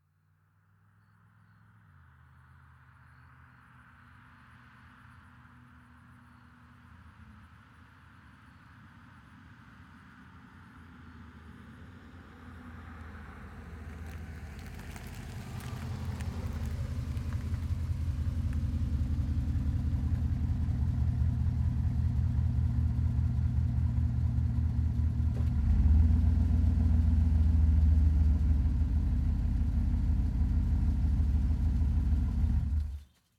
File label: Z28 In Slow